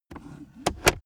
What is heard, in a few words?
car accessories center console drawer close fast; autos; verkehr transport